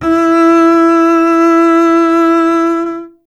STR CBS, Solo Instruments

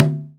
TABLA

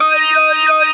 "ay ya ya", Talk Box; E-MU Producer Series Vol. 8 – Platinum Phatt

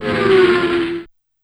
Car Passing, Yamaha PSR-E323 SFX Kit

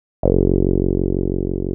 TB 303

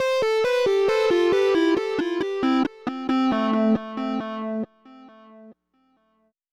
Dubwyzz Keys, Indie Rock

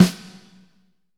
SNR, Roland; Northstar; Drumscapes Roland

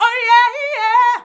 VOX VOX; Sound & Vision - Gigapack II CD 2

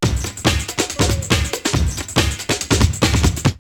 Onky Drummer 140, drumloops, Rave; X-Static Goldmine; 90 s Sample CDs

